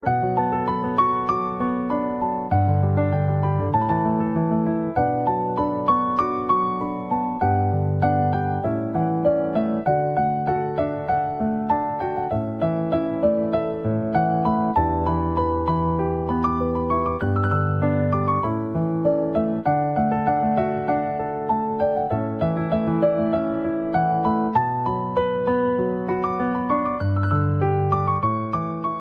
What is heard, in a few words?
Instrumental Ringtone